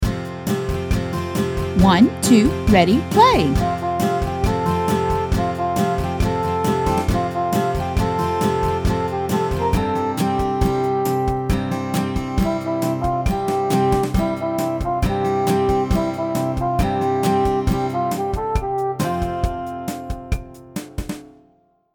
Apples and Bananas, ukulele; Rainbow Ukulele

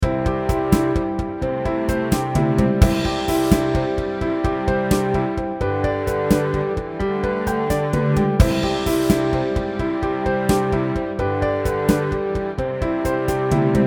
NO MELODY, ukulele; Rainbow Ukulele

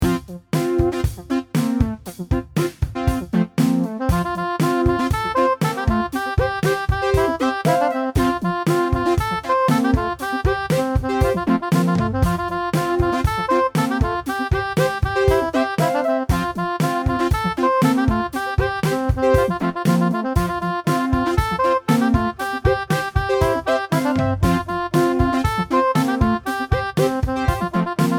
ukulele; Rainbow Ukulele

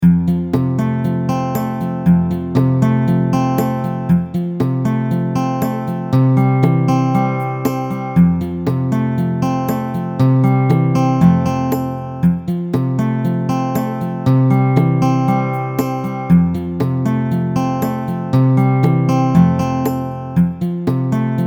No Melody, ukulele; Rainbow Ukulele

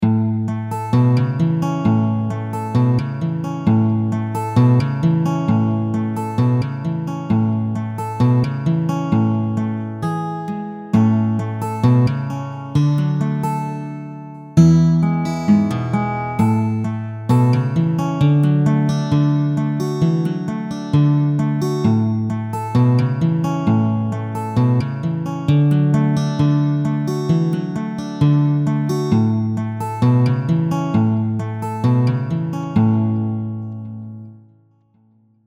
Yankee Doodle SLOW Tempo 66bpm No Melody, ukulele; Rainbow Ukulele Play-Along

